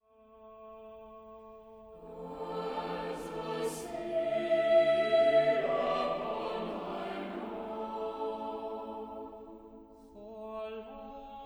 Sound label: Choir; Audio Loudspeaker Quality